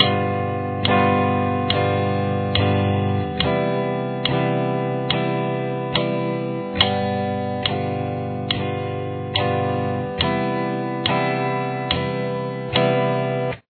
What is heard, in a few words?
open 13, guitar